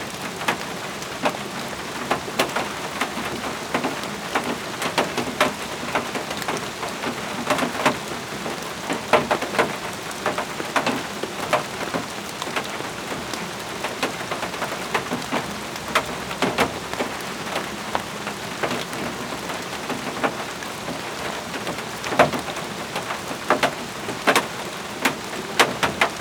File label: Rain on Plastic Outside Loop; Double Trouble Audio; Rain and Thunder